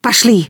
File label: attack, human woman fight; attack one; Stalker Anomaly